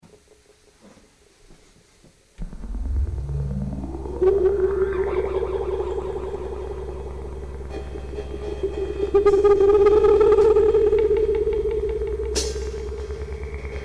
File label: Instrumental; hyper terrestrial